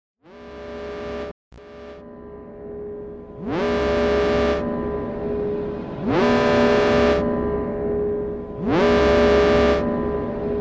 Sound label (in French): Alarme face aux intrusions et attentats; securite; alarmes et securite